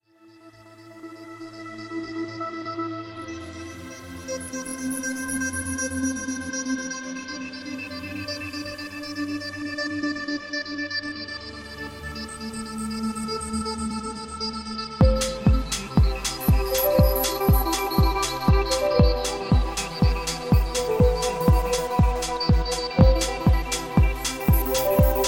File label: Club Beats